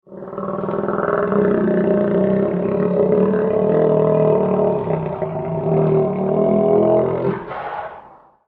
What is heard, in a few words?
Alligator Growl